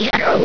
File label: death; alienarena; grey